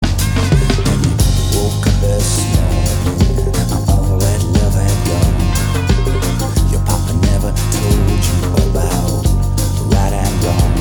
Ringtone

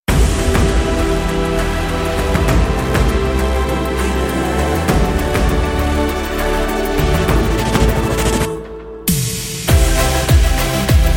anthem ringtone

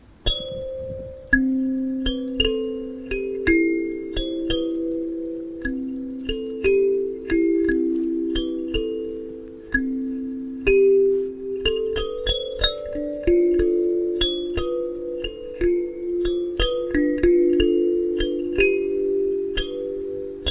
on a kalimba

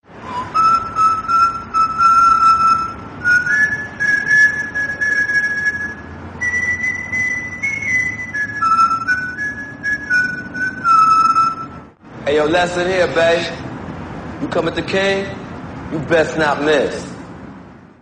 Whistle; Omar Little

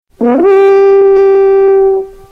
gjallarhorn; vikings

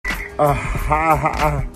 uh ha ha ringtone

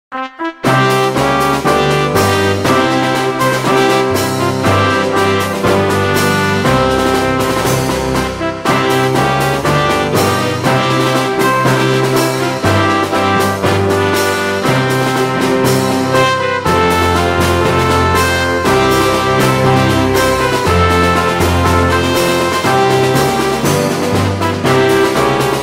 Marine Corps Hymn Ringtone and Alert

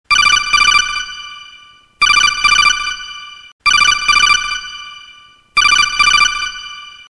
Metal Geared

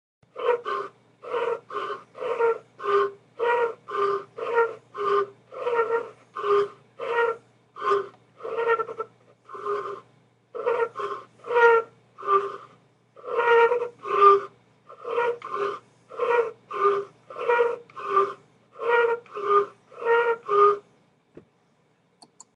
Straw sound

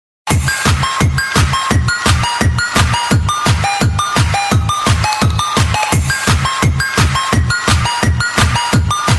kids tune

